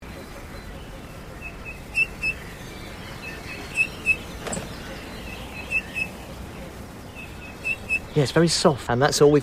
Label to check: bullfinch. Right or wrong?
Right